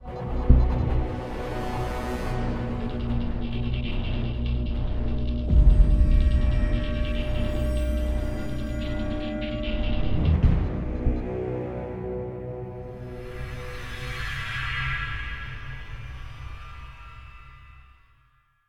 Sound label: Combat Dogfight; Elite Dangerous